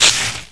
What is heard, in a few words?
spark; XDM; buttons